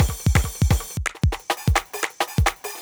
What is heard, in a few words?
funk crunk, Breaks; neuro; Sinistarr